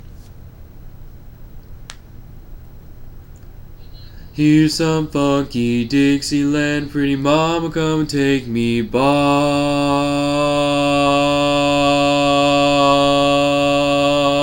Bass, barbershop

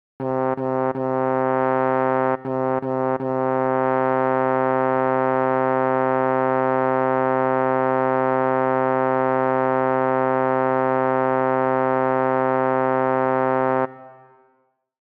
Bass, barbershop tags

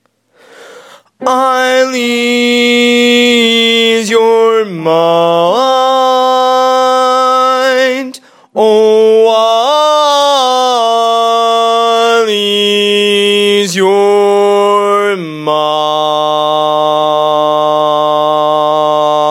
Bass, barbershop

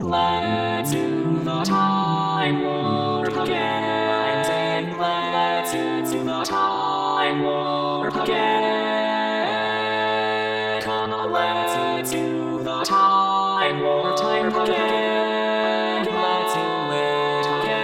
All Parts, barbershop

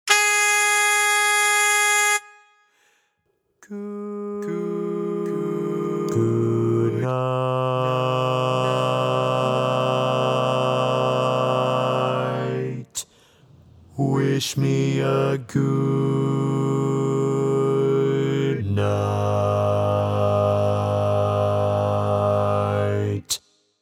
Bass, barbershop

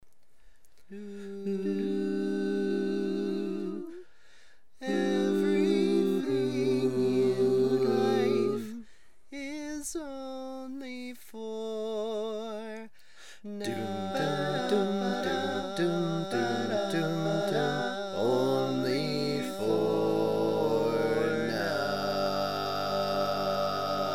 Bass, barbershop tag

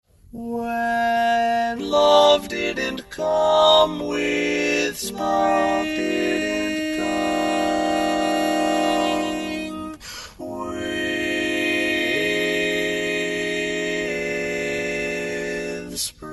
Lead, barbershop tags

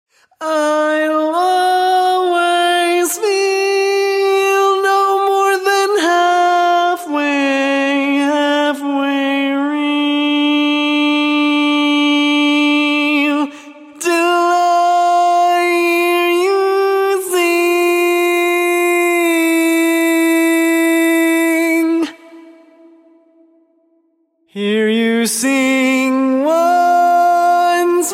Bari, barbershop

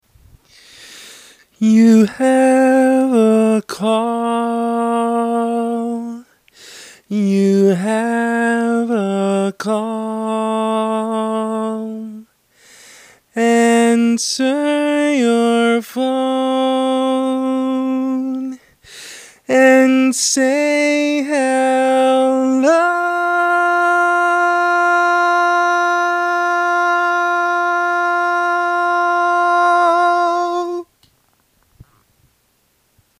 Lead, barbershop

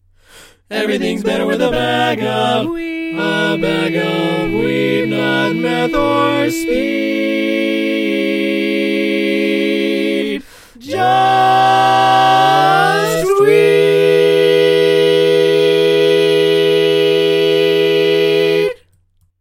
All Parts, barbershop

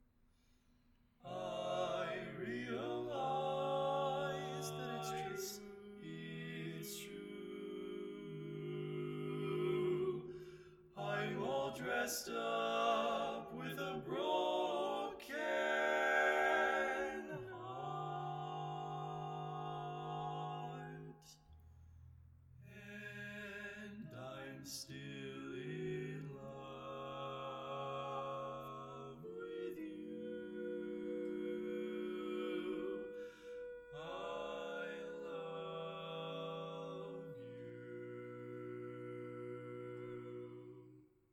Bari, barbershop tags

